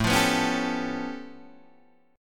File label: A Augmented 9th A Guitar Open D